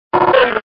Grito de Trapinch

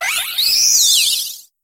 Grito de Ribombee

